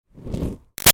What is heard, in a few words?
Fire Torch Whoosh Med Light; Flames; Sound Effects:Objects; Big Room Sound